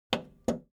ui button video tape player plastic rewind click slow